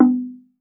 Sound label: TONGUE DR; TONGUE DRUM